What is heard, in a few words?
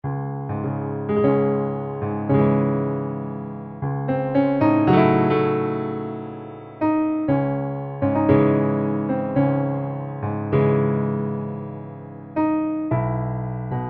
grand piano